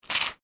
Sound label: human eat crunch toast; Human Bite/Crunch Toast; Eating; McKinney Sound